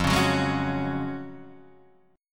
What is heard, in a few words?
Gb Major 7th Suspended 2nd